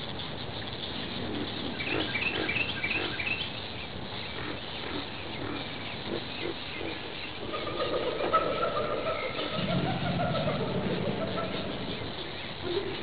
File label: birds, golden record